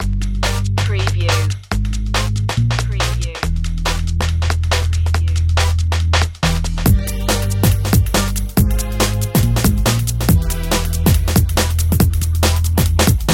fast spinning, A driving and exciting electro rock track, with edgy d; electronica dark heavy